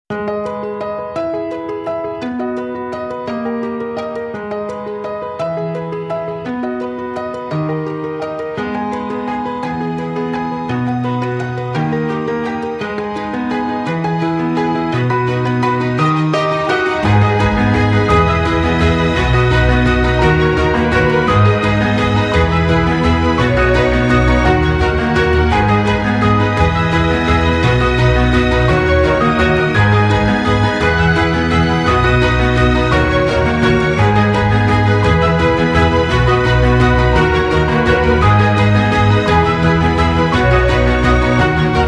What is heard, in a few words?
Orchestral Colors, Inst; Audiio